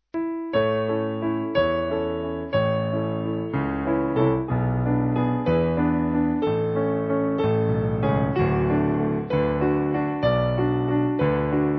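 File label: Piano, church music backing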